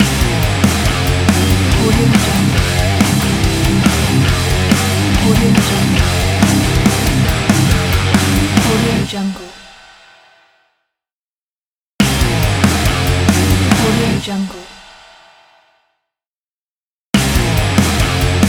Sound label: slide heavy metal logo